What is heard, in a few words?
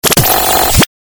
Galery Noise; Sound Ideas - Galery Noise 001